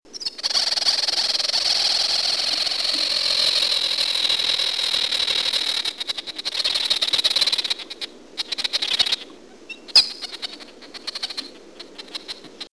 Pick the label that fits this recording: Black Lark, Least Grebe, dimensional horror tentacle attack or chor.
Least Grebe